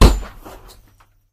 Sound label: Axe Blow On Chainmail; SyrinScape; Against the cult